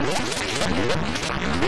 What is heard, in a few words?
Scifi Production Element - Down Yet Up